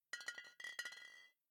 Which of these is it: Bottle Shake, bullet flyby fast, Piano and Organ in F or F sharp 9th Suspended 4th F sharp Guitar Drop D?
Bottle Shake